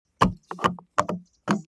transportation car bmw e46 exterior gas cap spin open